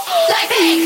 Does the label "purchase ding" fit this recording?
No